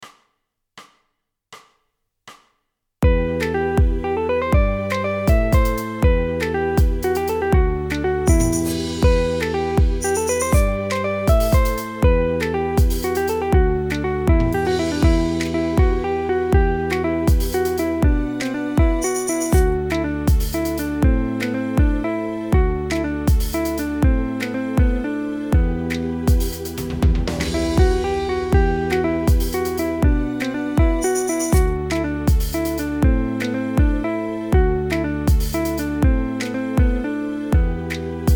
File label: 120bpm With Melody